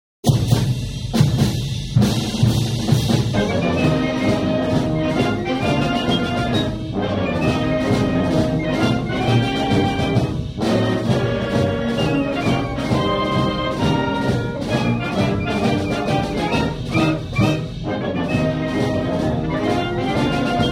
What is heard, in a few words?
drumroll